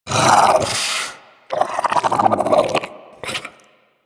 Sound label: Armadillo Vocal Tranquilized; Characters; Warframe; Hunting Critters